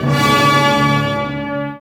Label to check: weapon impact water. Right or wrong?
Wrong